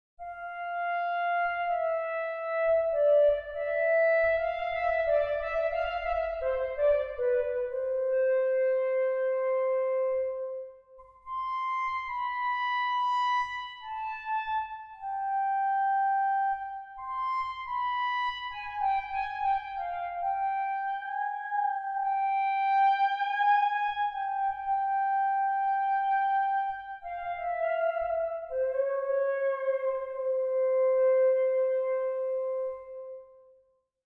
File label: Pure Data graphics tablet clarinet synth test